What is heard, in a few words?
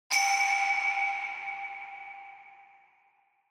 Single Accent Low